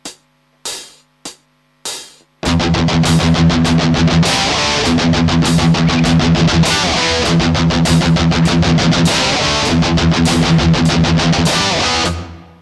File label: accent